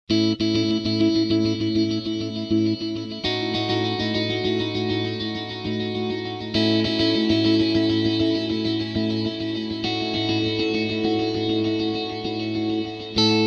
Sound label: delay 4 plex axe